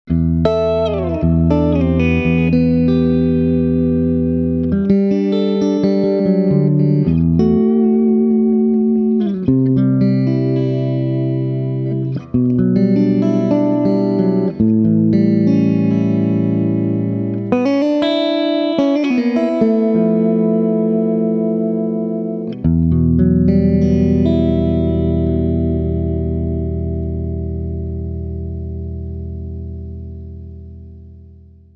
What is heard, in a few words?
Swart Amp